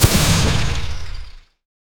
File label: short and powerful stone